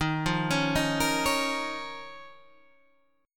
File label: D sharp 7th Suspended 2nd, Guitar, Standard; D#7sus2 arpeggiated; JGuitar